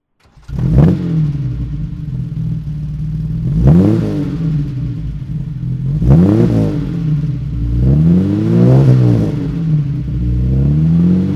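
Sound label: aston martin db2 vantage throttle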